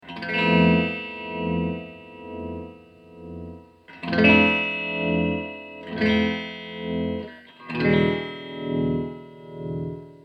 Slow Tremolo

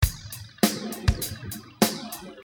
delay drum

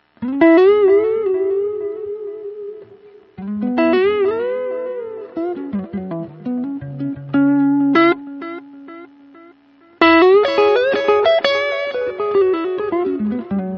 analog moddly

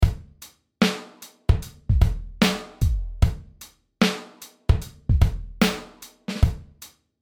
Hybrid Hop 75

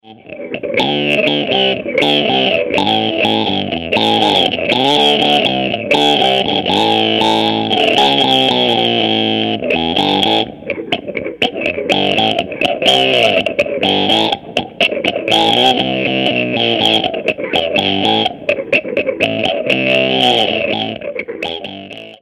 rock riff

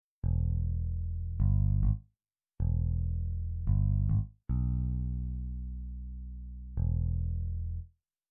Jazz Bass Pick

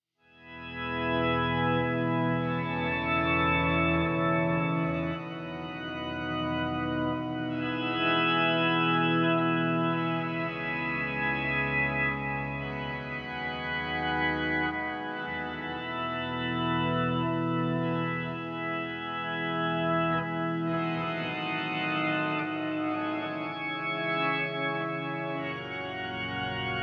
guitar to Cathedral Organ octave; POG

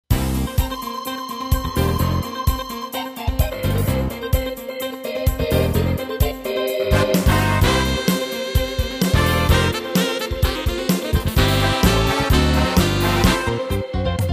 Soul; Tyros Style

